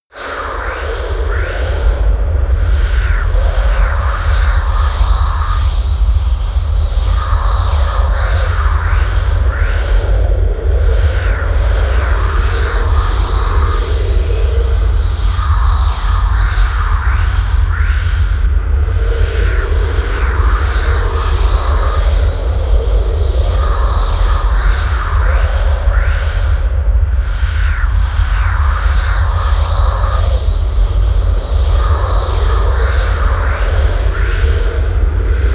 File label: atmosphere voices from hell, Horror